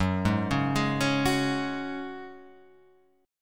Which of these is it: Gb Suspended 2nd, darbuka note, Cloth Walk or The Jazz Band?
Gb Suspended 2nd